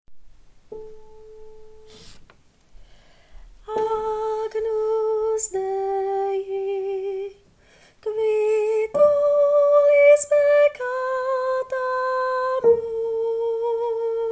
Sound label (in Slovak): sopran; Agnus Dei